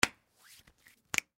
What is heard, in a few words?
Hand Shake Finger Snap Salute; Primping; Human; Blastwave